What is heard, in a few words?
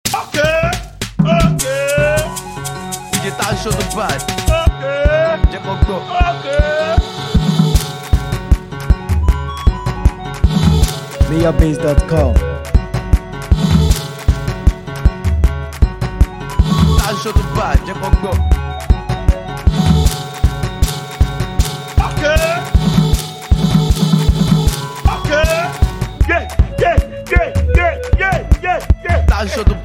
Mara Beat